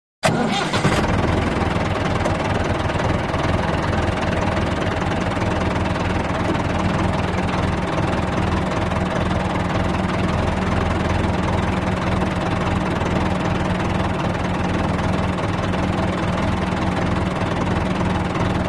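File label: Interior Tractor Start Up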